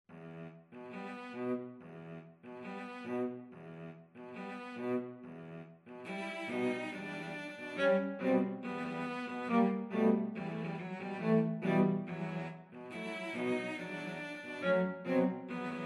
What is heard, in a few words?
habanera, cello trio; bizet